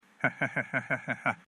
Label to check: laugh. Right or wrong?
Right